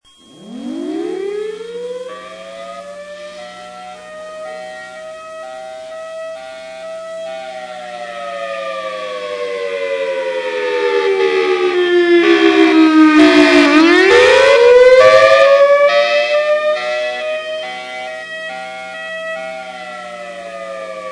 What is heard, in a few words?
thunderbolt high low